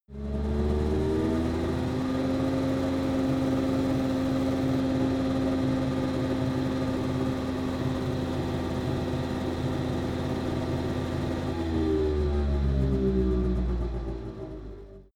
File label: car saab 92 rpm medium interior